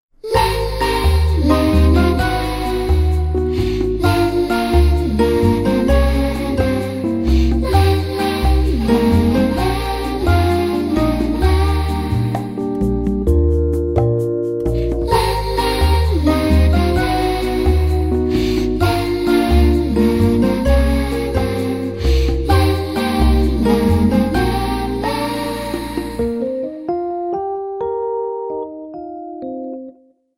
Lalala, ringtone; Samsung Galaxy K SHW-M130K

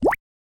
Water Drop Notification Evening; Xiaomi Redmi-Note-8-Pro

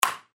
bounce; LG GD900 Crystal; Game Sound

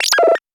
squiggle, notifications; Nothing Phone (1)

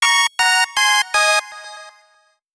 Alert; PlusFourSix; Patti; Lars Edvall

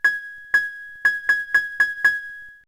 Camera time; Huawei U2801

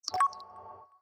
Cube Spinning; Samsung SCH-M710 T Omnia II

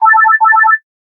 Bell Synthetic; Motorola RAZR-V-XT885; ringtones

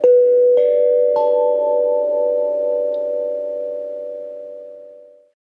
sms bowl; Mudita Pure

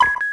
Cam Shutter; LG GM730 Eigen

